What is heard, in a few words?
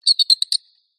squeaky metal Designed Alien Bird; PMSFX